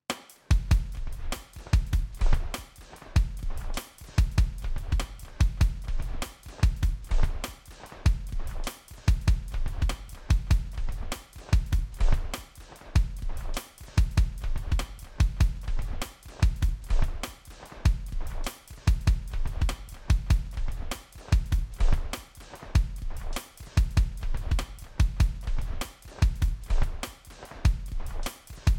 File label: TC2290 DT Drum More Mod